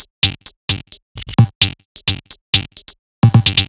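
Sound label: Odd Glitch, 130 BPM, Percussion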